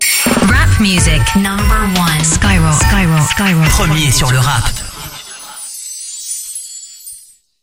JINGLE NOEL; SKYROCK; SKY 2025

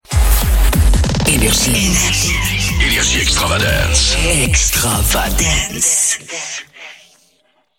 Jingle neutre; NRJ; EXTRAVADANCE

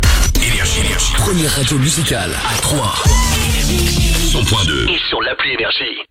JINGLE, Première Radio (NO WAVE); TROYES, 98.8 FM; NRJ

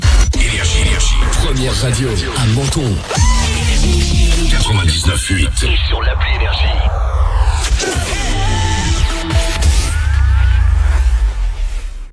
JINGLE, Première Radio; MENTON, 99.8 FM; NRJ

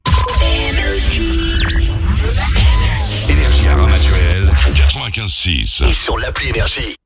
JINGLE Lancement Pub Locale; RAMATUELLE, 95.6 FM; NRJ

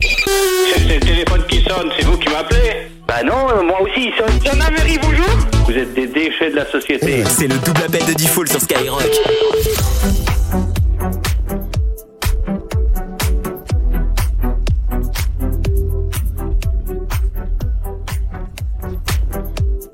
Jingle DOUBLE APPEL + BED; SKYROCK; HABILLAGE MORNING DIFOOL

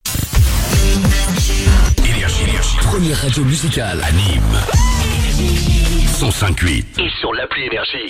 JINGLE, Première Radio (NO WAVE); NÎMES, 105.8 FM; NRJ

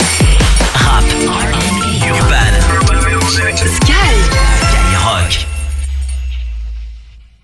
JINGLE NOEL; SKYROCK; SKY 2025

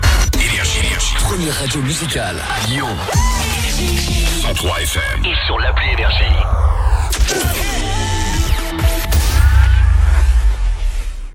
JINGLE, Première Radio; LYON, 103 FM; NRJ

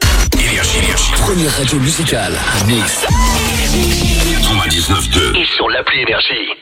JINGLE, Première Radio; NICE, 99.2; NRJ

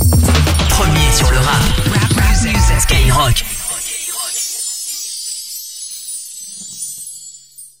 JINGLE NOEL; SKYROCK; SKY 2025

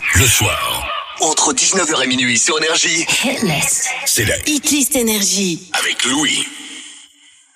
Acap; NRJ

